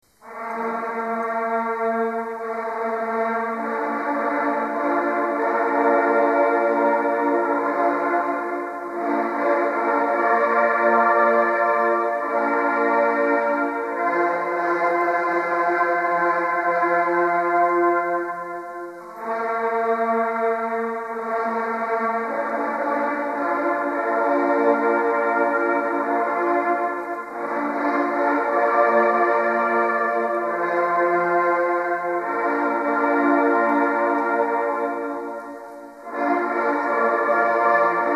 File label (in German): Hegewaldfanfare, Jagdhorn